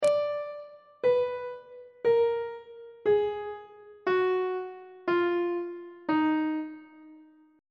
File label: d sharp harmonic minor scale descending on treble clef